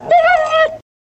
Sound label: Dog Yelp Painful